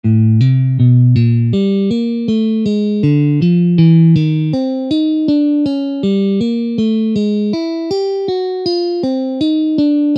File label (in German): Barree; pfadfinden